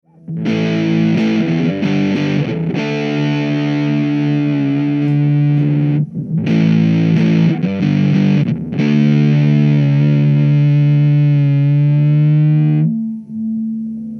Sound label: noswitch bigmuff riff